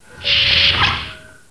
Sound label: ent door; Enterprise Door